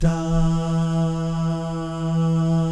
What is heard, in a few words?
DAH, Vocal Jazz; ILIO